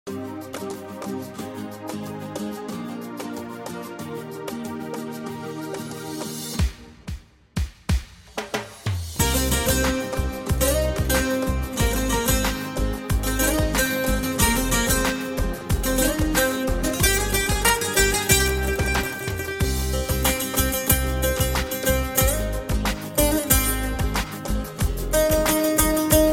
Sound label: Guitar cover